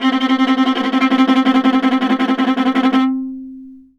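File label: VIOLA tremolo C3; Orchestral; DirectWave; Varazdin Orchestral; Viola II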